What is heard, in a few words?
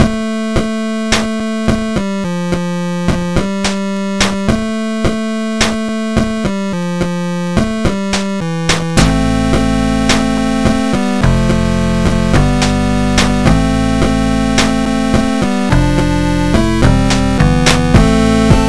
song